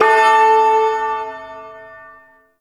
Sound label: Shawzin Oct One A; Warframe; Duviri Ersatz Shawzin